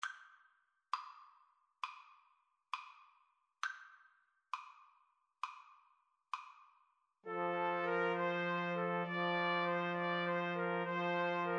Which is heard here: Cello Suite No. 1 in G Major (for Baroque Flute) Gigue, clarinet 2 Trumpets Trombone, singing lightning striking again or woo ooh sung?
clarinet 2 Trumpets Trombone